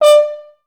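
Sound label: FHS2 staccato D4, Advanced Orchestra; Best Service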